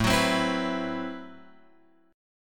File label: A Minor 6th Add 9th A Guitar Open F; Am6add9 strummed; x,4,6,7,7,6; JGuitar